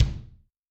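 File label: bass drum room, Kick, Yamaha DTXpress-II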